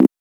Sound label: perc